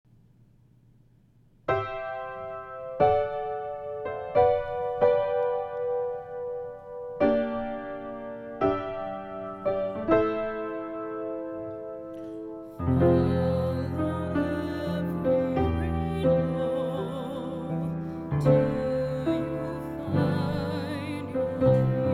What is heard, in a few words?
finale alto